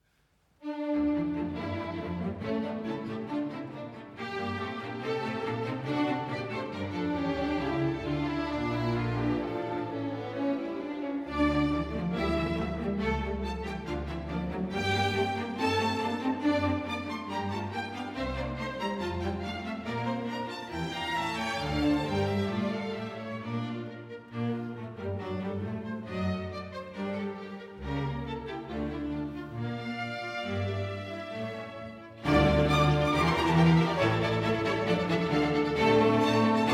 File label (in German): doppelkonzert d moll allegro; mendelssohn bartholdy